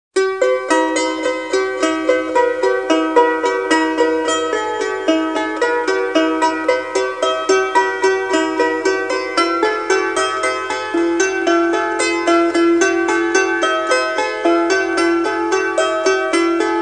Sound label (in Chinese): 下课音乐; 信号类